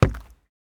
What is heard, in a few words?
footsteps wood